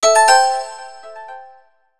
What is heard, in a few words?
Juggler Alert; Sony Ericsson; C901; PlusFourSix; Lars Edvall